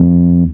BAS TON, SOLID BAS; E-Lab; X Static Goldmine 2; akai

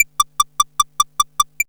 SYN SEQ

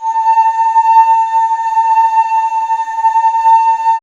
BAMBOO

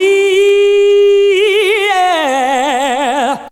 VOC; ZERO-G; Datafile

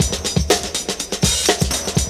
D3 BREAK

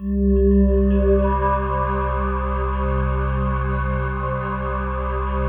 PAD; Best Service; XX Large; Pads

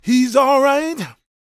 GOSP MALE, Vocal Planet Gospel

